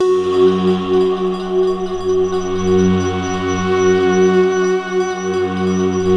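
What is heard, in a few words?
mystery pad, Stack; Optical Media International; Sonic Images Library; Mellowery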